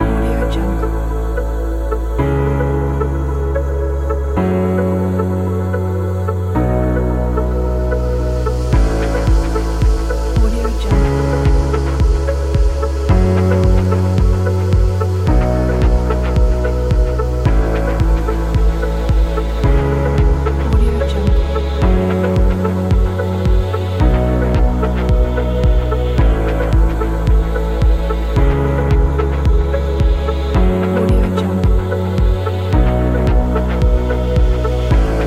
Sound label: soft ambient